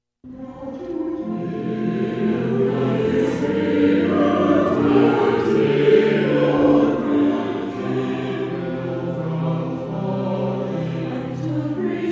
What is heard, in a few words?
Doxology Choir; Jude Doxology